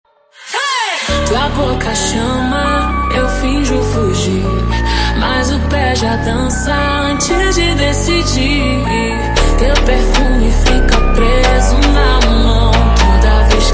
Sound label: slowed, funk